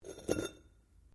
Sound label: METAL ON STONE SCRAPE; Metal (Sound Effects:Materials)